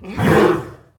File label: attack; CosmicRage; combat; creatures; horse